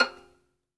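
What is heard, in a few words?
solo violin, strings; ILIO; Synclavier Strings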